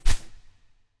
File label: wing single; smite; khepri